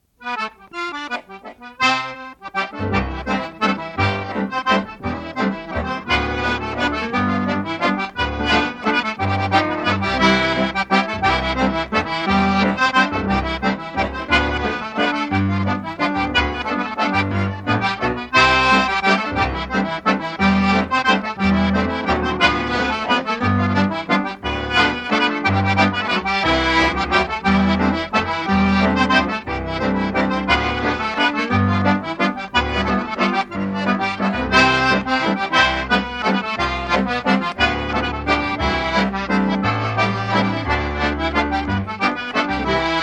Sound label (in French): Valse C; Valses